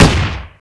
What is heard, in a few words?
fire; gr9